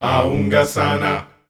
A-unga Sana G 120, Voices Of Africa Sung Phrases

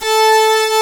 SYNCLAVIER; DIGI2 FM+SMP

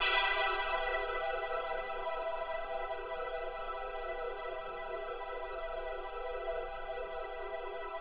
120 Pad A, Keys, Nu Jazz House; PowerFX

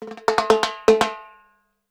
TIMBALE; Houseworx; Drums; Perc

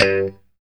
POP G 2, STRAT guitar; Spectrasonics; Hans Zimmer Guitars VOL-1; JC-STRAT POP

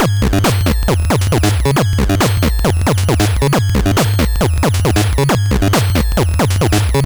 LSDJ Wonkin' Loop 136BpM, Nintendo Gameboy Loops; maurice.rebell Loops

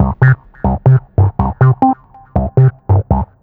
Trance Bassloop 140bpm, Bass Arps; Zero-G Trance Inducer; 90 s Sample CDs

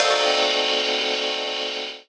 RAW RIDE; Rawcutz - Nu Forms; Gbm

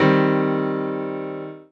Piano OS; Rondo Brothers Trip-Hop Loops & Samples

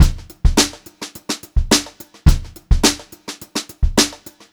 HOTROD VARI, Brushed Drum Loops; USB Soundscan vol.39; AKAI; 90 s Sample CDs

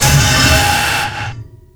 SMASH IT; Disjointed Textures; USB Soundscan vol.33; AKAI; BLASTER

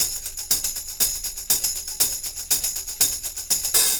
120 tam + hh; Percussion

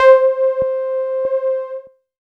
C4, Roland JV 2080 TECHNO PAD

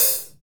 hat clear, Hi-Hats; Roland L-CDX-01; HH Modules

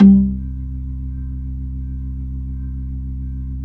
B-3, Jazz

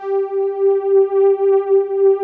PADS; Pro-Rec - Synthsonic; Z1 PADS

